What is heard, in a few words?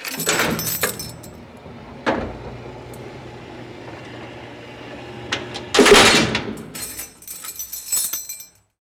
door open; Golden Crayon Sounds